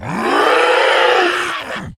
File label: Infected Quillflinger Attack